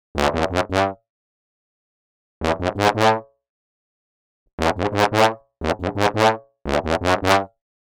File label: tuba